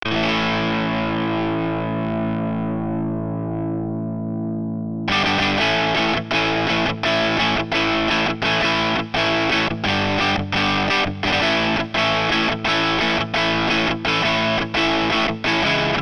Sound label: keystrummer